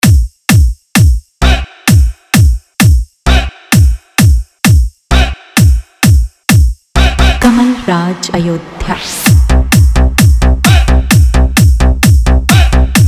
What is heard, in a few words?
Sound Check Hard Bass Only Hard Bass; Dj Competition Dilogue Power Hard Bass; Dj KamalRaj Ayodhya